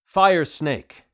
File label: fire snake; 5etools; bestiary